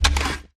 monitor hold; p00gie

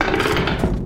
control throttle, tardis; drmatt

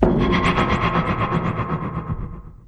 tardis delete; p00gie

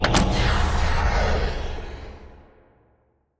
colored lever, tardis; p00gie

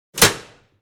red lever off, tardis; p00gie

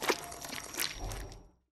telepathic on, tardis; p00gie

